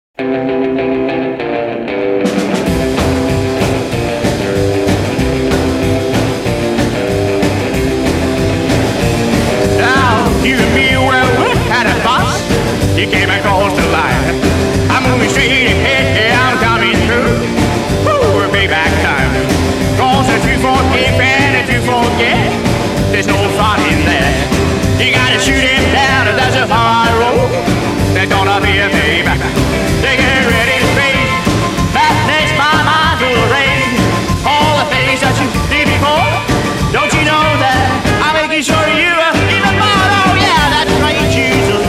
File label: ROCKAB, rockabilly; Rock’n’Roll